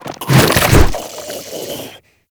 Infested Ancient Strike Flesh; Warframe

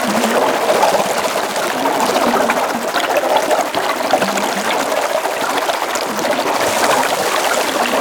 Aqueous Discs Loop; Yareli

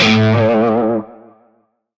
Shawzin Flourish Oct One A; Music; Warframe; Narmer Shawzin

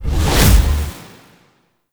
Sundering Dissipation Cast; Warframe; Focus; Unairu

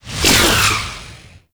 Orbital Strike Ability Cast Throw; Warframe; Trapper

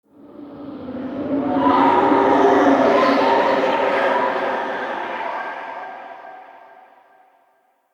Eerie Texture; Ambience; RedNight

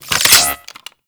Reload; Warframe; Weapons; Spectra

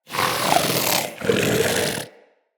Vocal Breathe Slow; Characters; Hunting Critters; Vulpaphyla

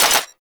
Long Gun Mech; Warframe; Weapons; Thano Tech Arch Long Gun

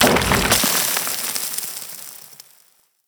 Micro Burrow Exit; Warframe; Corpus; Arachnoid Micro; Abilities

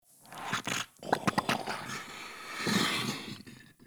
Goop Thick Burst; Ambience; Misc Outdoor; Warframe; Infested Eris

